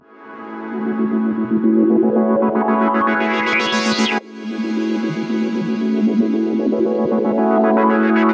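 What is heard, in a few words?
115 A major Synth Bubbles; Synths; Zero-G; Future Wave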